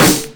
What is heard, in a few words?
clear boom bap hip hop acoustic snare d sharp key, Royality free snare single hit tuned to the D# note